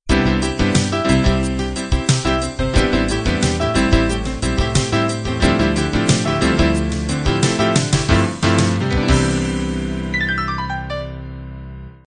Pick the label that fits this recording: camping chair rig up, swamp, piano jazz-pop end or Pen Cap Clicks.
piano jazz-pop end